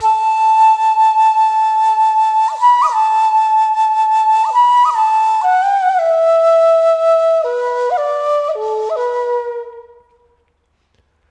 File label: flute, ethno; eJay